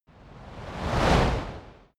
fire torch bright slow flutter whoosh; swooshes-swishes

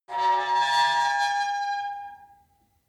metal squeal saturated tonal; industrie-wirtschaft

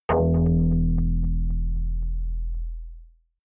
synth hit drop echo deep

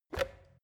plastic water boiler lid off quick; maschinen; wasserkocher; soundpool

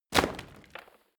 rotten wood drop; berufe; foerster

